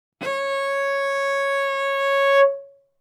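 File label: Cello arco fortissimo sul A Db4; MIS Pitches - 2014; Strings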